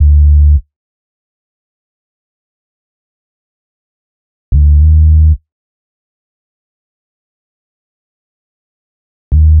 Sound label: Bass, Hip Hop Exotica, 100 Dmin; Apple Loops; Big Fish Audio